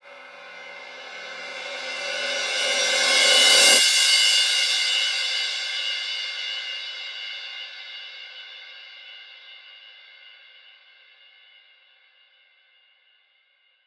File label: Fx Melodic Deep